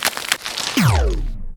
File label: golem spawn; Risk of Rain